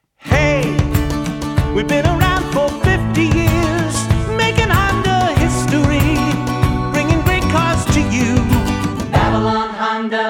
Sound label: Jingle, Chorus Front; Babylon Honda